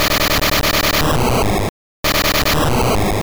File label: Self Destruct; Pokemon; Gen 2; attack moves; GSC